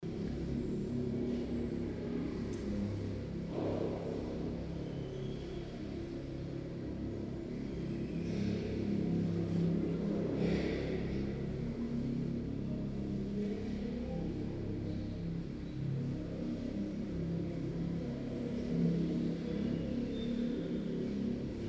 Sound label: Buckfast Organ Pipe; buckfast abbey atmos POV of the organ; field recordings